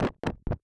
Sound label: eat meat; adarkroom